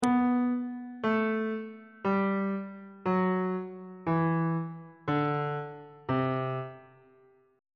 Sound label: b sharp lydian mode descending on bass clef